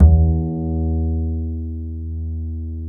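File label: DB E1, double bass; Balkanish Traditions; USB Soundscan vol.63; AKAI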